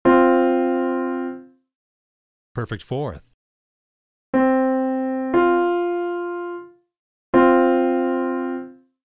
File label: Perfect Fourth Example; interval